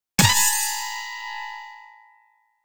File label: shaker